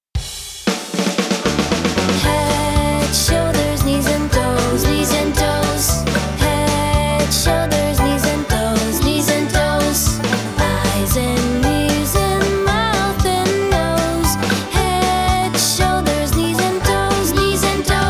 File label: Musica Head Shoulders Knees and Toes